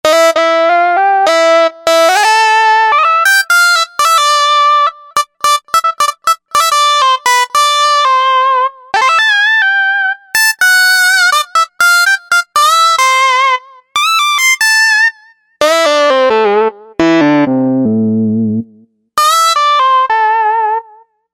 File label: Vintage Ld